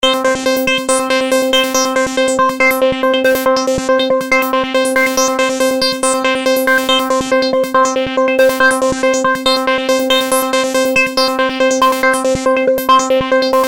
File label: Acid Alarm